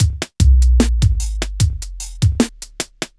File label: hiphop